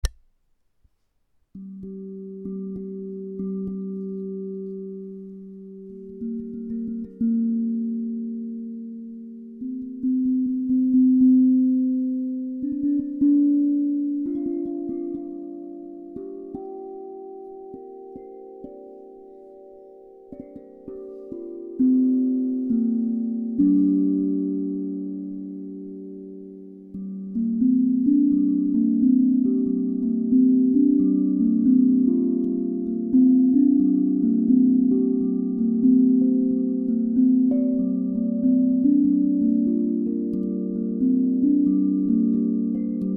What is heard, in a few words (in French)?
Sol penta mineur octave baguettes, Tank drum Sol pentatonique mineur 432 hz; osb-drum